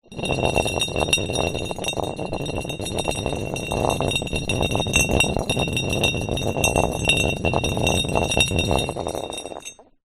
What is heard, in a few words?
large empty clay demijohn roll on concrete ground; foley